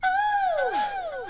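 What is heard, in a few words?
voice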